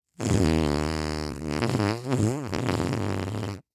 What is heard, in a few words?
human farting